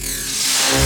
reverse, FX; Vengeance Samples

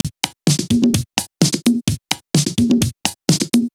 House Beat, 128 BPM

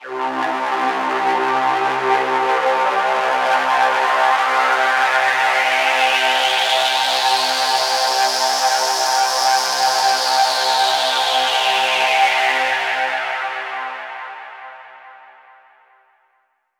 Trance Sweeps; Vengeance Samples; VEC2 FX

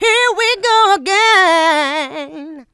voc, female vox, Vocal House

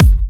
Hard Kicks; Vengeance Samples

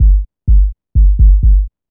Sub, 126 BPM; Vengeance Samples; Vocal-Hook Kits; Song Kit

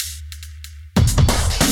Fill 140 BPM

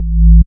Bass Cuts; Vengeance Samples; VES1 Instruments

